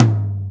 drum

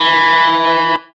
electric guitar

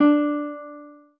spanish guitar

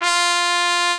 mexican trumpet

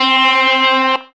electric guitar

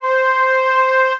flute

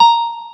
piano

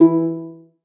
lyre

